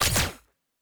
Weapon Shoot

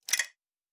Metal Tools

